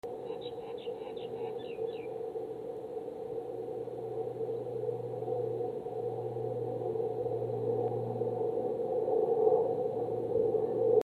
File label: St George terrace Gphone and bird